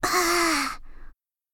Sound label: Voice Breathing